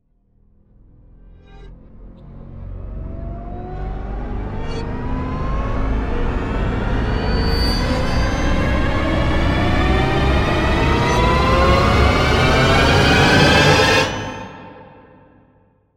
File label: Riser